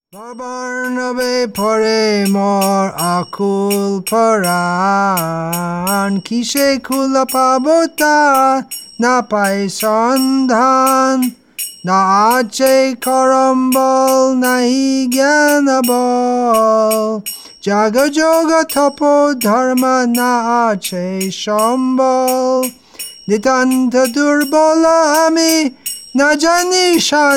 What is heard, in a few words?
Bhajan; Chennai Tamil Nadu India